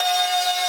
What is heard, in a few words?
synth main